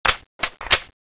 sniper reload; z-lapik ru

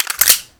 glock clip in

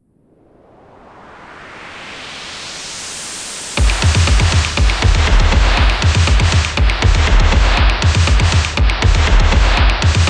radio looped; outland underground

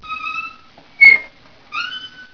swing

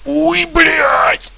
die; z-lapik ru

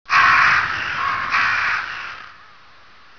raven

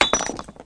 grenade hit

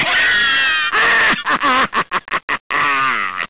laugh

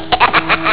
elf haha

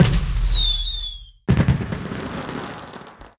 firework

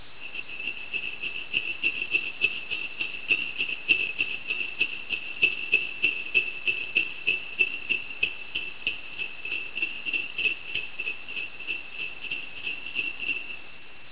jingle bells ringing

